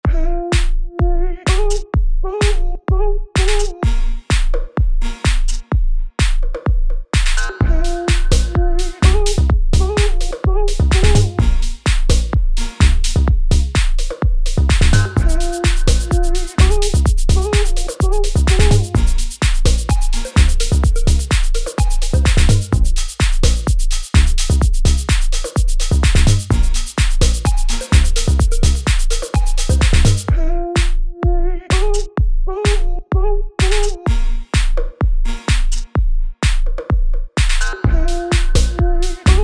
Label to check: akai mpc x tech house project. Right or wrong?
Right